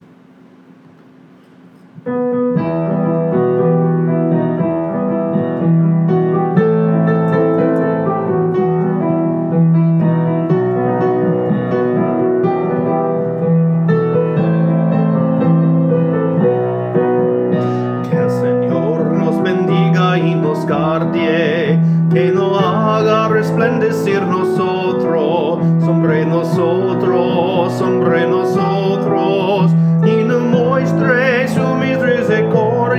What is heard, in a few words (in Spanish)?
bendicion